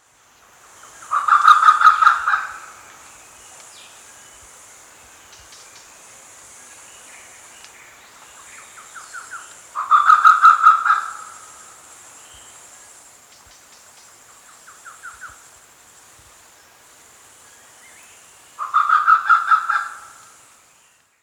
grey peacock pheasant; immersive pheasant bird sounds for birdwatchers

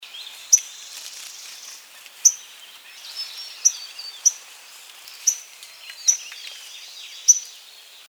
vinaceous rosefinch

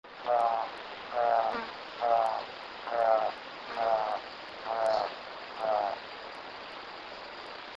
spot billed toucanet

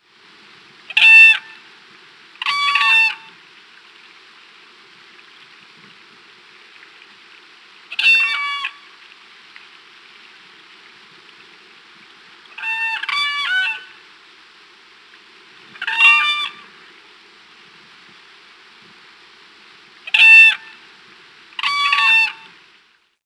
whooping crane

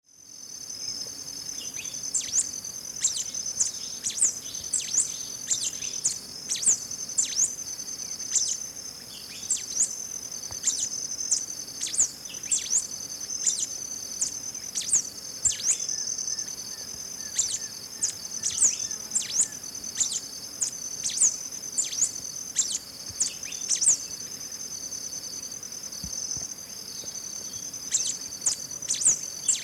black capped warbling finch

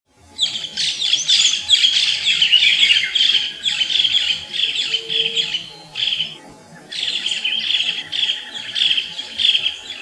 orange fronted parakeet

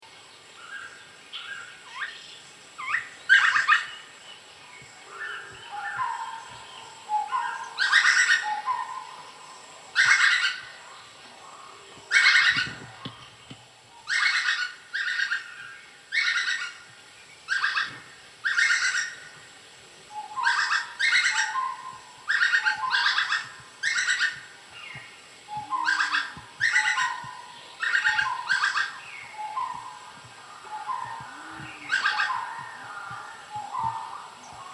great slaty woodpecker

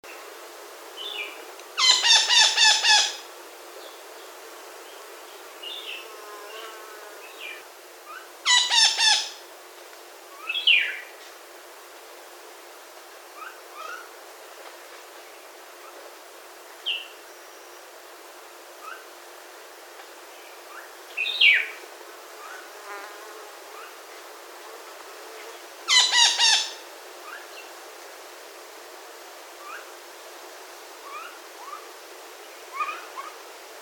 mauritius parakeet

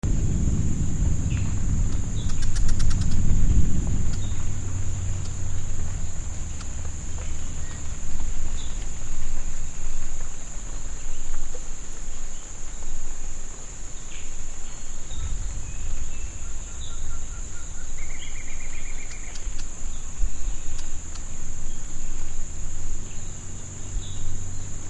green fronted hummingbird

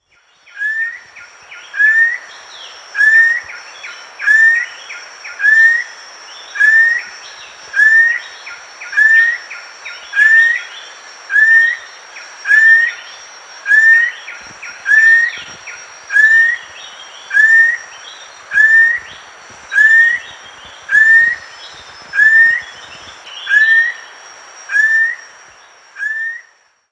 rufous collared kingfisher

